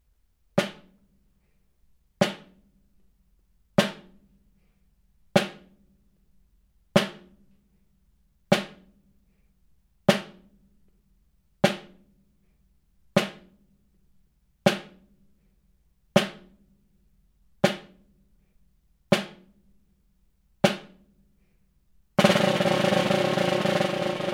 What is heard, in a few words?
snare